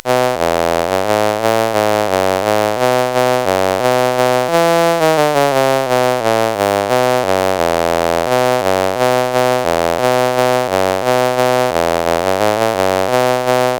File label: sid CSG8580R5, GAMES; Dinky Doo